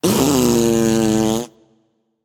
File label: Tongue, Dragon; fafnir